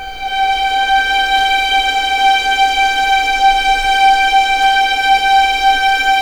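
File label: G5 legato violin; East West; The Ultimate String Collection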